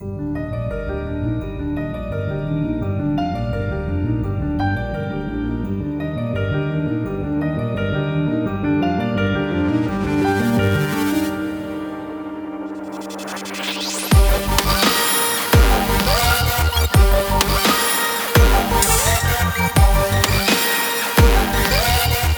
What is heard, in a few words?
Agressivo Main Full 170BPM; Production Music Examples